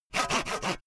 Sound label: sawing small